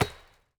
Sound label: lumber tree